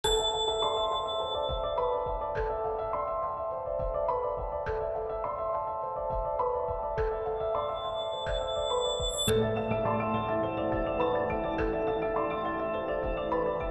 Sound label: Event Suspense Light